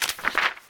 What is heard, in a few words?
page turn